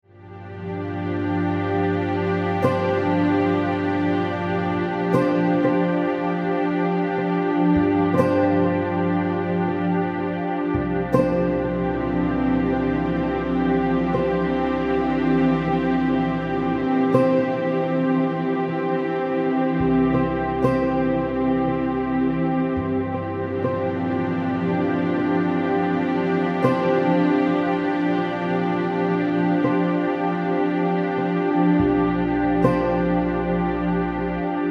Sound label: Zen Music